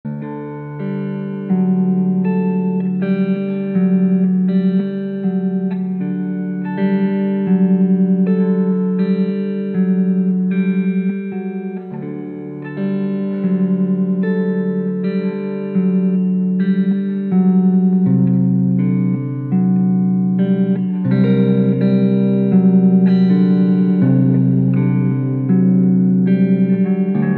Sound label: Berceuse E Guit